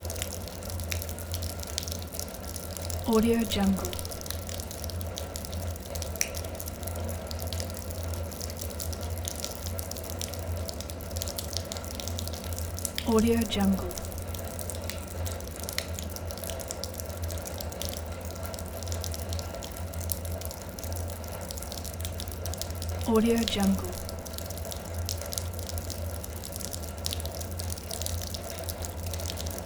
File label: water drip splatter constant